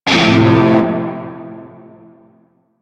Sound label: Shawzin Maj Chord G; Music; Warframe; Narmer Shawzin